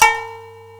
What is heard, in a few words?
SYAMI A2#, ETHNIC; AKAI S-Series CD-ROM Sound Library VOL-8